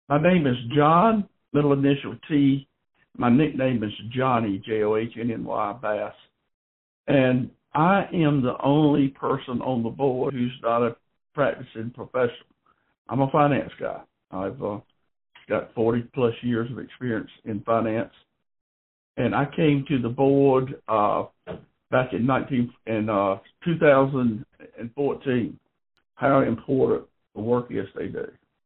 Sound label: Bass